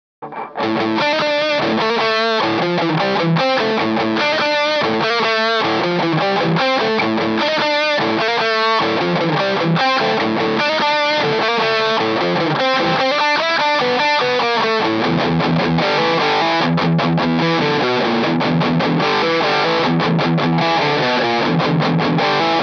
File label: amp normal